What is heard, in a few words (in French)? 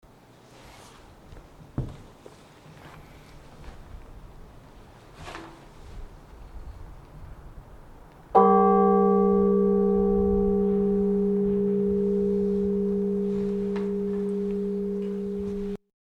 cloche; Laprugne, cloche 2